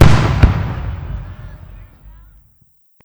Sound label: s blast, weapons